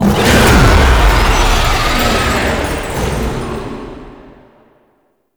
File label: Phase Transition Stinger; Roathe; Warframe; Tau